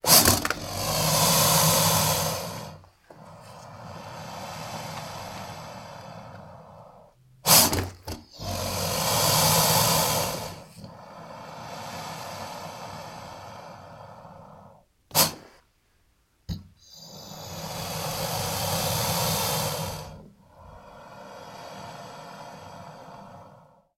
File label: Alligator Snorts Chu